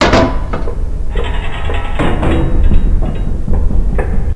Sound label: elev move